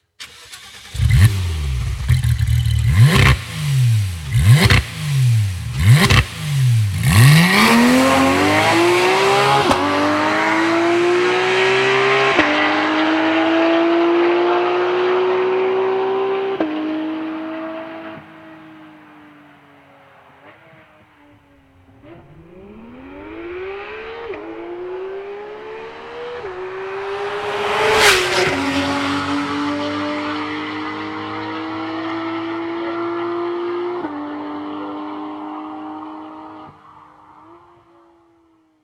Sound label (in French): Porsche 911 991 gt3 RS 991 MK2 sonorite avec echappement sport